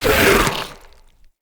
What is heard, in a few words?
Infested Femur Shoulder Fire Stop